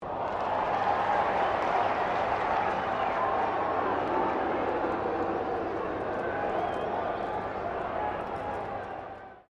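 crowd 20K Melbourne Cricket Ground cheer low, Cricket at Melbourne Cricket Ground; Crowds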